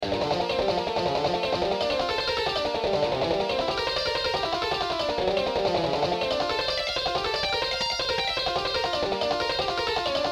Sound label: Lick; Monster Lick